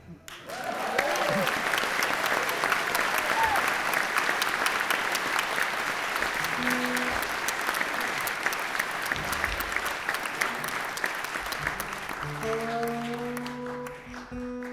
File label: tuning